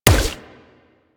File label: Water Sprayer Punch Layer; Warframe